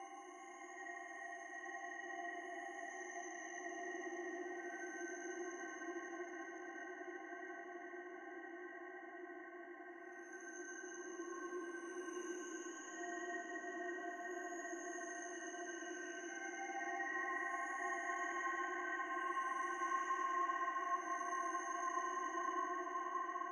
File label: Ambience Bed Chimes Loop; Josh Journey Darkness Totems; PS4, Switch, Windows, Xbox One gamerip; Santuario